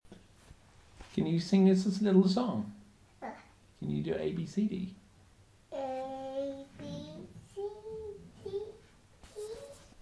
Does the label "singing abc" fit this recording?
Yes